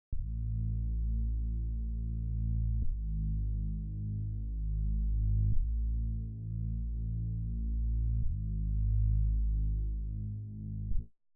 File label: Reese; 13 Festival